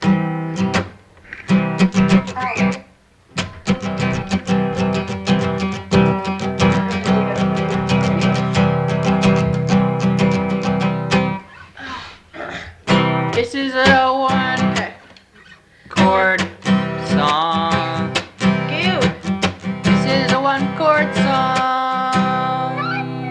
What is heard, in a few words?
One Chord Song Improvisation